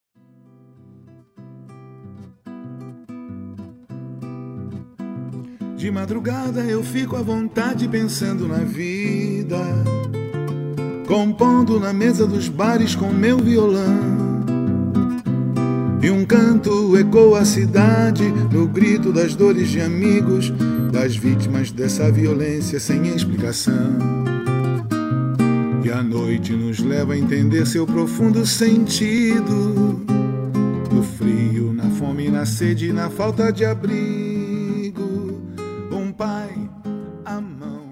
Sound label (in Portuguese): viola